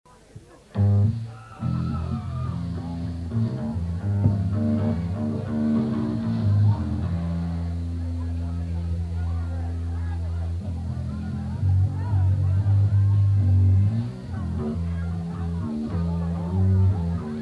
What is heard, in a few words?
Tuning